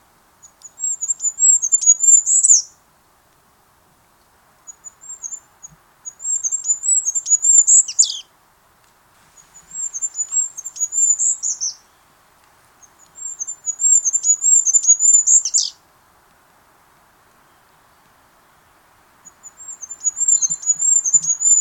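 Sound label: korolek, goldcrest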